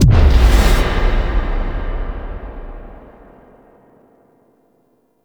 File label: Boss Fight Start Stinger; Warframe; Captain Vor; Captain Vor Redux